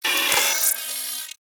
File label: Railjack Turret Harness Movement One Off E; Warframe; Vehicles